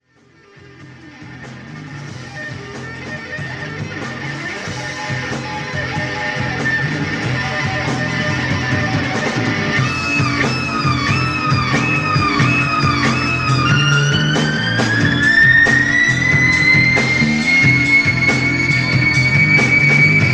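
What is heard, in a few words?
impossible note bends, guitar; bizarre bends; Zystrix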